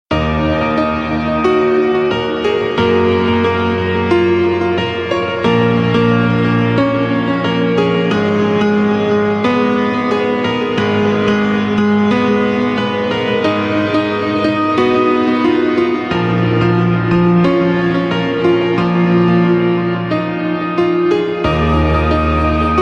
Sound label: Piano